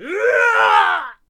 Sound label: Shield Bearer Attack Vocals